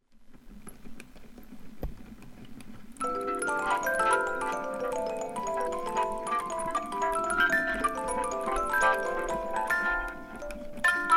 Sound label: music box study